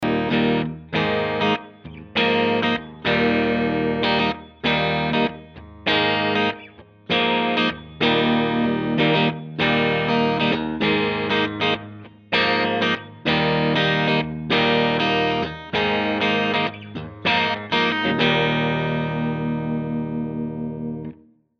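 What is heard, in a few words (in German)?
Sterling Majesty X Beide Humbucker Clean, E-Gitarre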